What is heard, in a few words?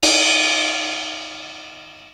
JZ RIDE, Beatmachine; Hollywood Edge; The Big Bad Beatmachine; XE-8